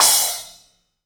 DW Green Maple Cymbal Grab; Sonic Foundry (Sony Creative Software); Vital Drums

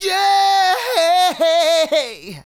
Male Solo, Hip-Hop and RnB Vocals

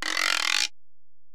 Ac Perc, Drum Machines Alesis DM-PRO

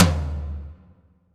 R TOM LW, Drum Mashines; EdgeSounds; Drum Mashines VOL-1; R-100 DRUMS

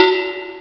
m1 cowbell; ST-XX Amiga Soundtracker Sample Packs

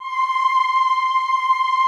OHH C5, Classical Choir; Best Service; Extended Classical Choir

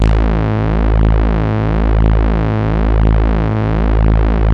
MOOG, Bass; Masterbits CLIMAX 7; MORE MOOG

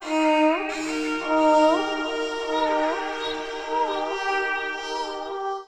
VIOLIN FX; USB Soundscan vol.60; Asian Traditions; AKAI; VIOLON FX

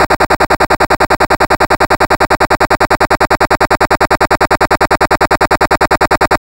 Atari 2600 Noise Hats

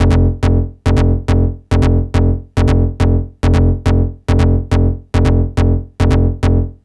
NRG Bass, Trance

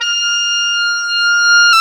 oboe, mf f; Roland L-CDX-03 Disk 1; Oboe 2&3

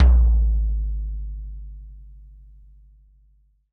TD-20 Tom A, Roland TD-20 Toms

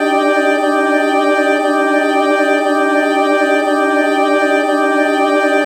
C, Drum & Bass Pads; Total Drum&Bass; 90 s Sample CDs

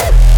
fat kick

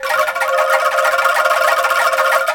ANGKLUNGS; Spectrasonics - Heart of Asia CD2

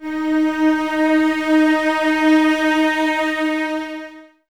Cellos D#4 Expressivo; Miroslav Vitous; String Ensembles

